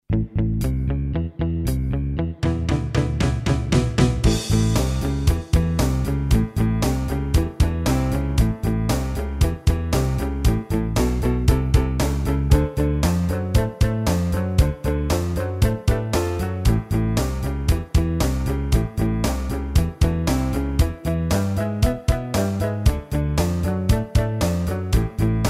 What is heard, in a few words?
Road Revel Rock, gitaarstarter; Meespeel CD